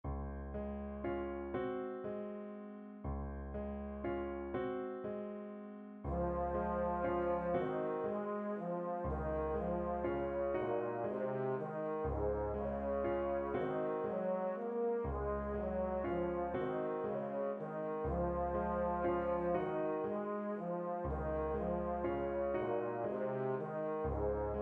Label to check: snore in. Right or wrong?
Wrong